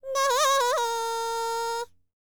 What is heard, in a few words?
BALKAN, World Voices; ILIO; Vocal Planet